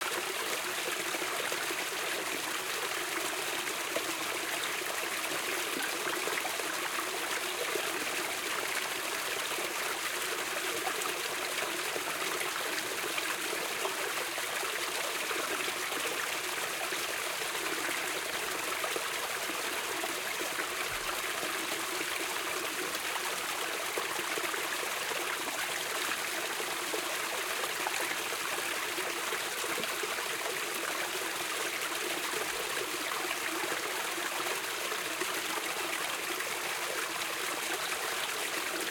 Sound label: Small Creek Close; Stefano Cremona; Rivers, Streams, Creeks